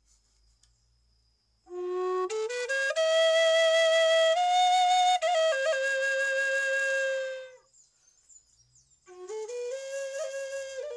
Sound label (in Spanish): Flauta escultorica serpiente en FA